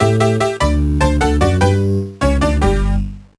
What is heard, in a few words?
Game Over; SMA4; SMB3